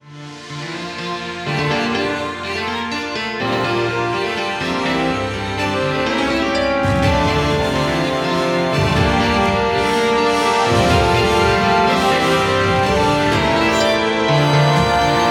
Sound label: Adventure Fantasy